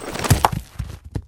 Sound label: find, hand mining; Cosmic Rage; The Experience-light